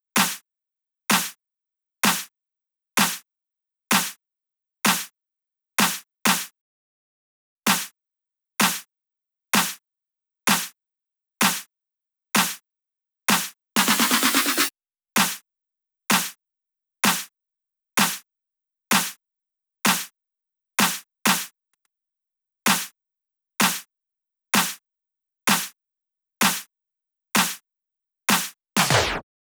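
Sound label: Drum Loop 128BPM snare; VEC5 Drumloops; VEC5 Multi Loops 128BPM; Loop Elements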